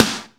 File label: GATE SNARE